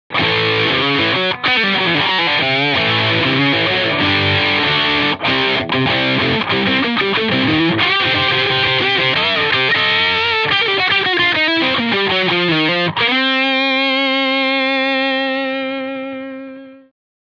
Sonic Disto, pedales

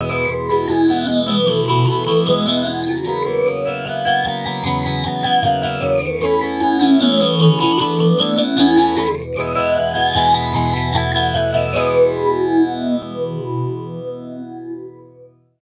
PH350 Rotary Phaser, pedales

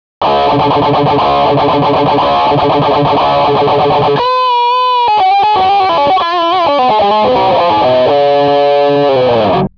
controlled feedback; pedales; Metal Master

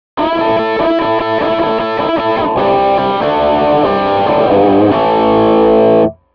proco rat

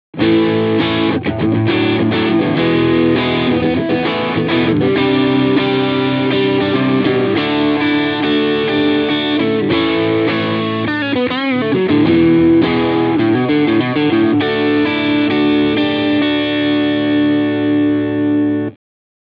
OD820 Over Drive Pro, pedales

